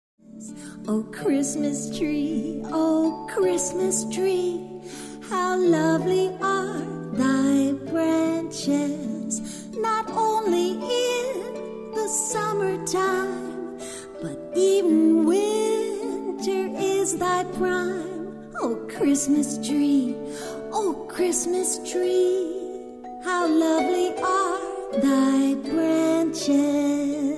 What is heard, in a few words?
karaoke vocal; christmas favorites; disney